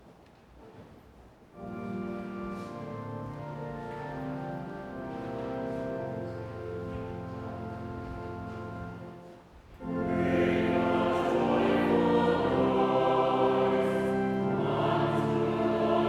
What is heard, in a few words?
Psalm 100 Anglican Chant